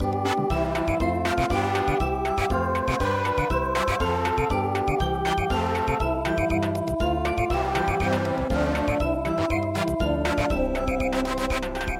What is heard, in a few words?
Samba, traditional